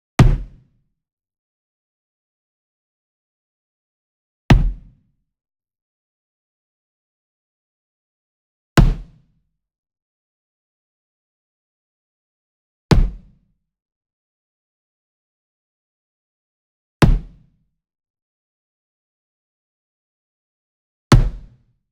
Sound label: Sweetener Wood Mallet Single Mid Hit Near; boom library; Cinematic Strikes Construction Kit